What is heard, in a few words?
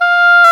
WND OBOE Dry